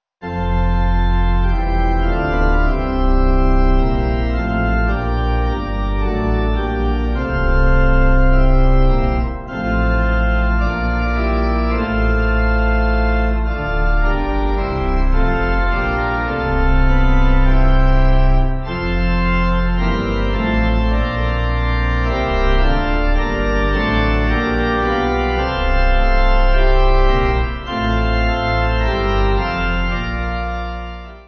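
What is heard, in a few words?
Pipe, pipe organ; Now Once Again; Welwyn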